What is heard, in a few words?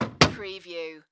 Cabinet Door Close